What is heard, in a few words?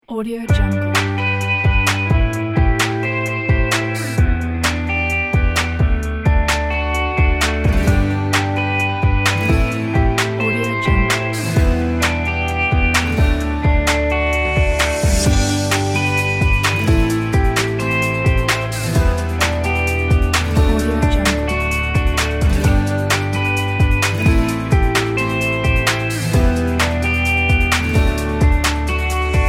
joyful activities